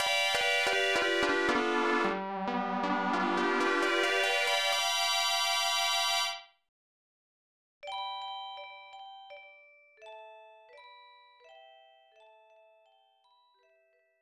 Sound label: Important Item; Magna Braban; SNES